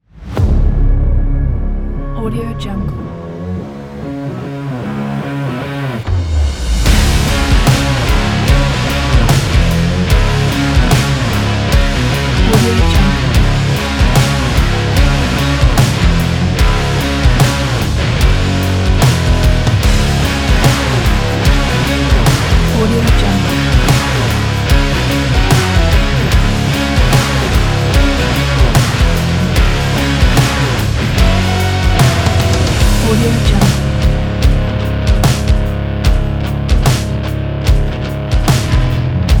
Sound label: raw catchy fuzz rock